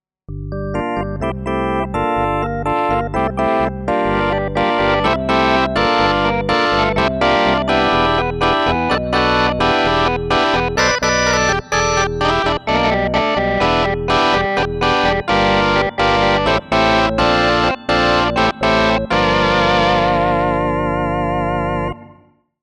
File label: Montage